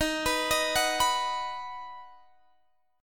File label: Eb Minor Sharp 5th Guitar Drop D